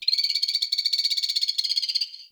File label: Beach Night Poison Frog; Warframe; Grineer Beach